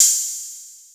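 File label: open hi-hat, Drum Machines; AcidLab Miami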